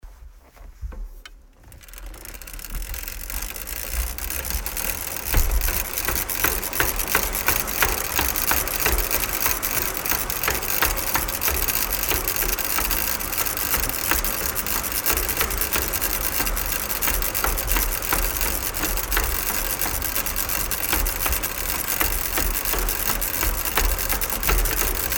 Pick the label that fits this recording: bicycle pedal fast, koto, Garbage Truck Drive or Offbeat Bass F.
bicycle pedal fast